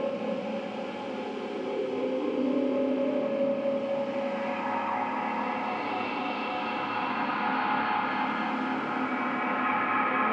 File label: Ethereal Loop; fa battlemaps